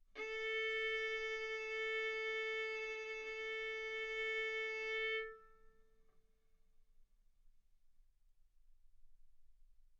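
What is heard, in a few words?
Violin arco mf A string A4 B4 stereo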